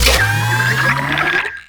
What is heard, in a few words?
Purify Machine Activate; Ambience; Warframe; Infested Eris; Encounters